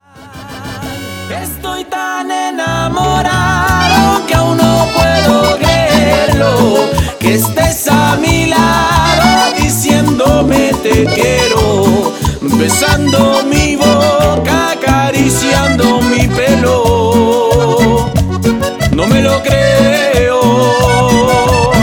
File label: Latin Groove